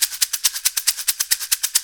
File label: SHAKER LP140; Best Service Alpha Dance vol.2